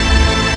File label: TECHNO HIT